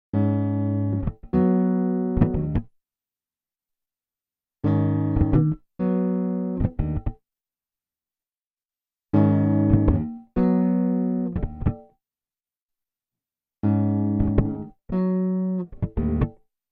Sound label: guitar